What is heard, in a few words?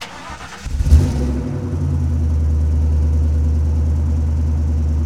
Truck Explorer Start Loop; Trucks